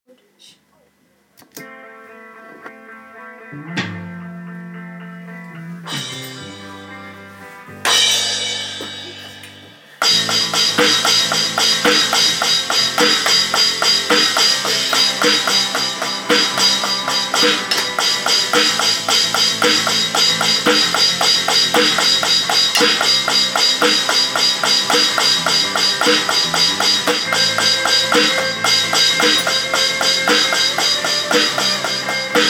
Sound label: 4:4 rock beat